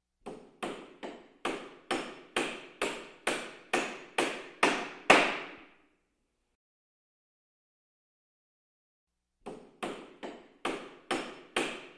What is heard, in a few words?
carpenter, صدای نجاری